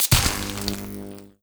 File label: buzz zap, electrics; creatures